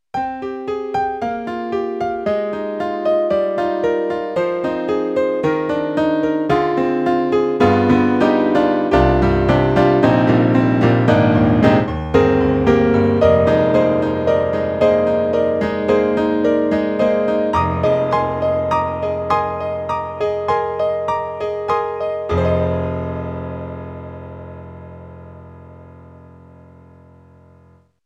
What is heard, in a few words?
YAMAHA XG SoftSynthesizer, Bright Piano; WDM